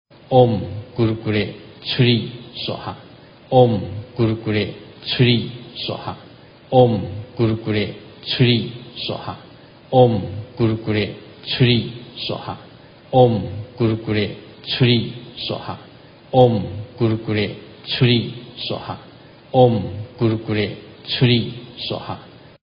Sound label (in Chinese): 咕嚕咕咧佛母心咒 Kurukulla Bhagavati Mantra